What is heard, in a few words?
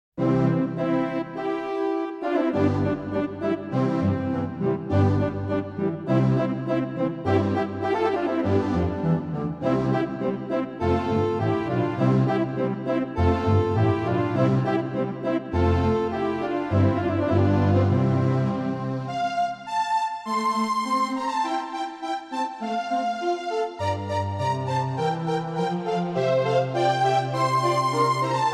Echo of Horns Through the Castle; Dragon Quest Game Music Super Collection Vol.3